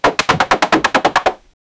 wood being chopped with a, axe

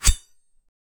deep loud very heavy stro, blade

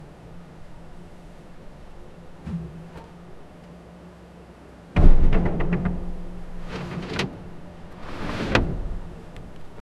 the sound of a sea; door opening

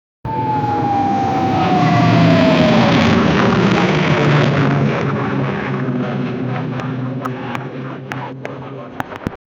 old plane engine sound; airplane engine

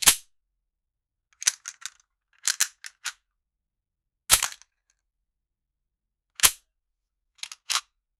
a weapon magazine is inse

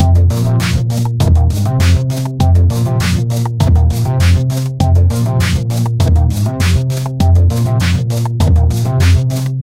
dubstep growl sounding, electronic music; dubstep growl sounding vo

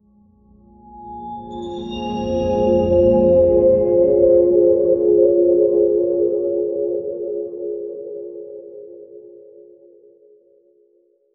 a mystical ambient whoosh; celestial

